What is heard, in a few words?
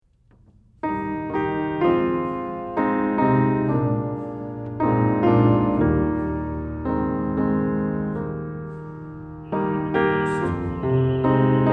Piano; piano accompaniment pieces